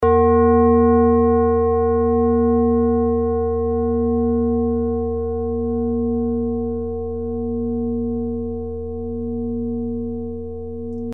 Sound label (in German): bengalen klangschale